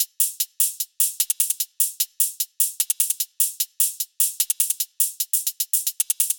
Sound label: Dubstep Hihat Loop - 150 BPM; Drums; Loops - Top